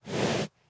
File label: breathe, horse; mob; minecraft